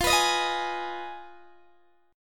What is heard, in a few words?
F Minor 7th Flat 5th, Guitar, Open D